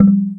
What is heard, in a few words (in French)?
BRIQUE; Ultranium; OdjazdowyPakiet